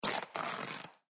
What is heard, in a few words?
chair